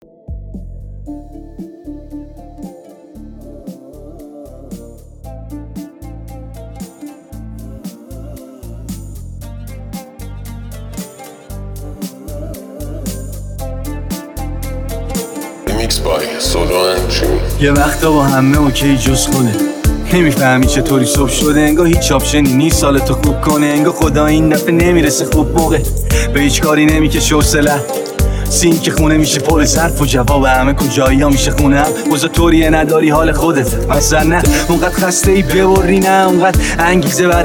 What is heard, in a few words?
remix rap dj solo